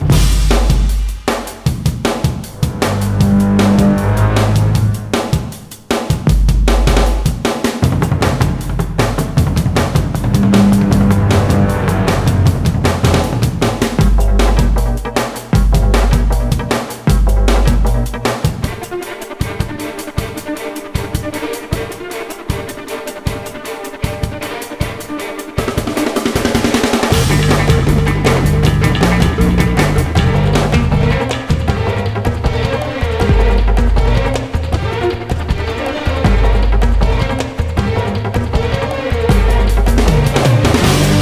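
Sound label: Low Combat loop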